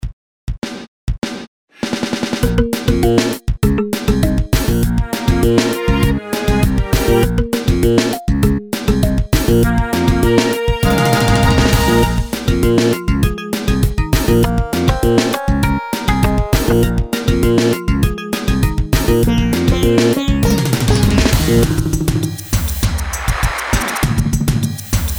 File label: microQ synth